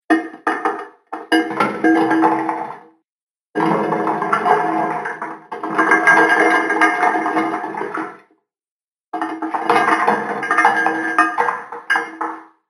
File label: Inside The Old Boat Shipyard Ambience; Ambient; Andrew Fleming